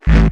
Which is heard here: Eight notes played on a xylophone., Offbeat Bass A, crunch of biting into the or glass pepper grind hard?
Offbeat Bass A